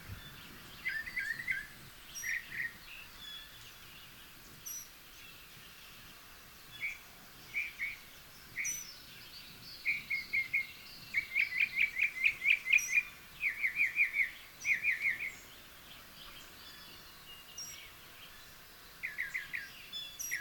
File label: Northern mockingbird